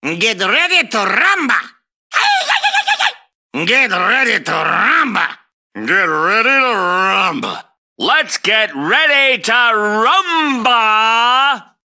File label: Rumba